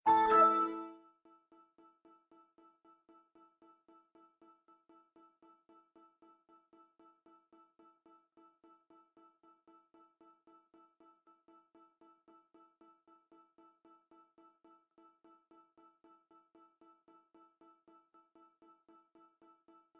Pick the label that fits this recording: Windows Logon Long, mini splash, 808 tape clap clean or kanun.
Windows Logon Long